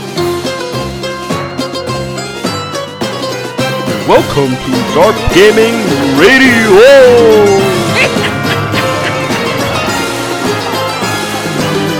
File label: voting music no; deathrun